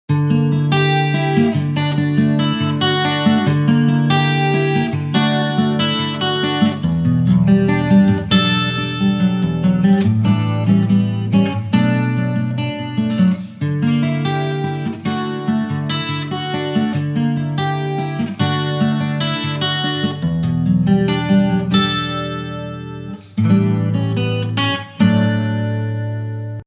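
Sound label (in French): guitar chorus, guitare acoustique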